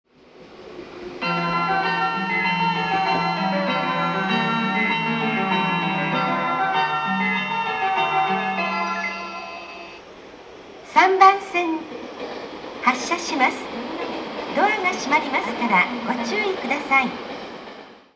nippori melody; jreast

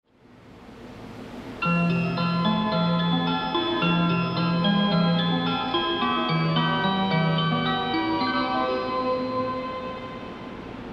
melody, station

